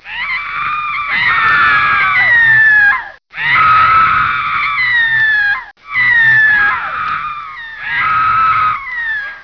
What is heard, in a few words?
Woman screaming